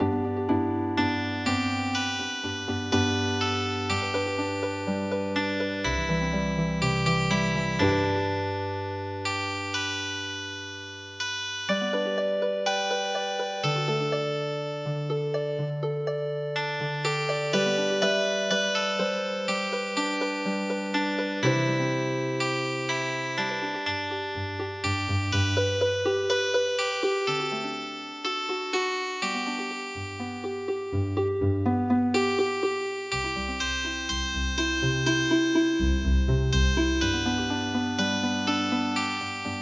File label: Healing Harp